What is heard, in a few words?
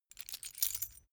key ring mid size pick one; objekte; schluessel